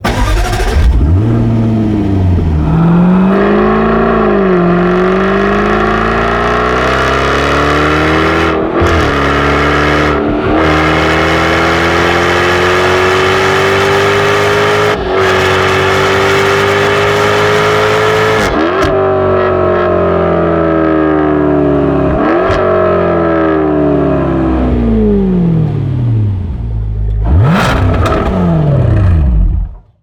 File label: Jaguar F TYPE V8 Soundscape